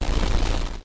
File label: Camera Interrupt; Five Nights at Candy's